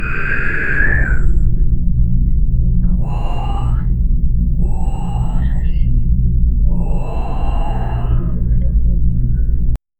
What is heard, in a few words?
low whisper voices overla